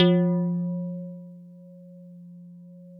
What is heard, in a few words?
HARP F2; Yamaha VP-1; Music Store; HARPOPHON